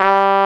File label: BRASS G1, POP BRASS; AKAI S6000 CD-ROM - Volume 1; BrassWind